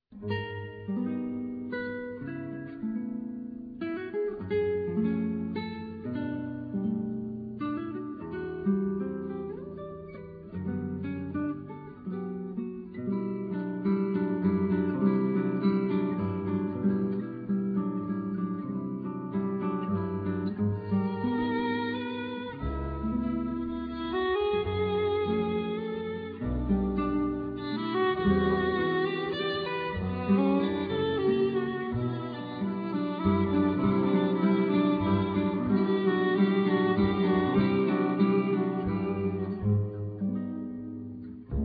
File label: violin; italy